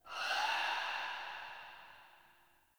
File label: Soul Steal Latent Random